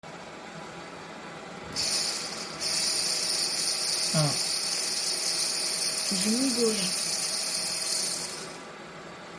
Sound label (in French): moteur electrique tete d aspirateur balais bruit moteur aspi